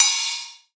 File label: trap open hi hat sound f sharp key